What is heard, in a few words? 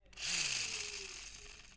Toy Car Pull Back Wheels